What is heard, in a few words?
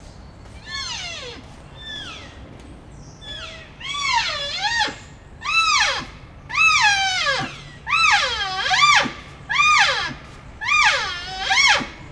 Cervus elaphus nannodes female; Calls of the Lesser wapiti hinds (C. e. nannodes) are dissonant, consistently to the hoarse sounding stags of this subspecies